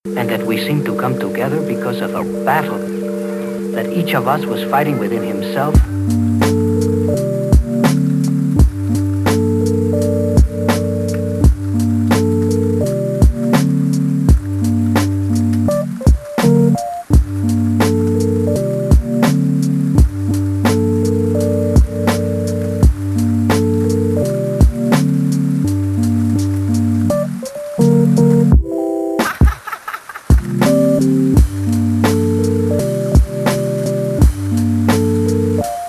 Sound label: Hip Hop